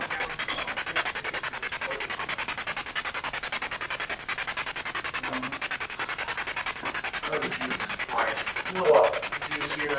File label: Spirit Box; 2nd Floor Bedroom